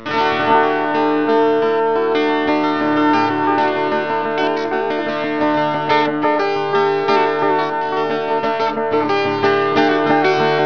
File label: guitar